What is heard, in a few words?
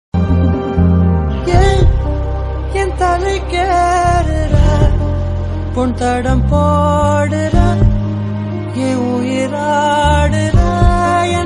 love ringtone love bgm; vada chennai